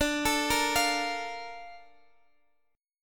Bb Major 7th, D

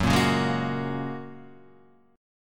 F sharp Major Add 9th, Guitar Half Step Down

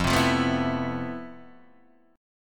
F Major Sharp 11th Guitar DADGAD, chord; down; chord shape

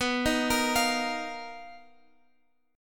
B Major 7th Flat 5th B down Guitar Open G

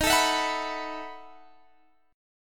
E Major 7th Suspended 2nd Suspended 4th

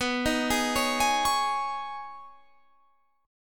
C sharp 7th Suspended 2nd B

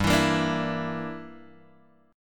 G Minor 6th, Guitar Open D

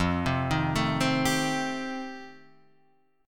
F 9th F Guitar Half Step Down, chord

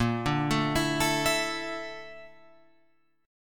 A sharp Major Sharp 11th, down, Guitar, Open F; arpeggiated; chord; JGuitar

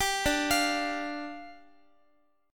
D Minor Double Flat 5th, down, Guitar; Dmbb5; arpeggiated; JGuitar

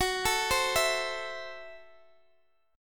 F sharp Minor 7th Flat 5th, Guitar Open G; chordshape; down

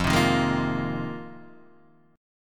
F Minor 11th, Guitar DADGAD

